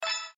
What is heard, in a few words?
coin, fishing; casino